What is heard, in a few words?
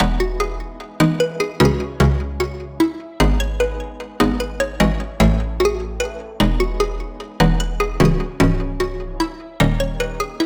Instrumental; Zamn!; Friday Night Fever Frenzy Side Stories; Windows